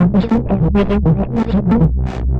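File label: rhythm loops, 100 bpm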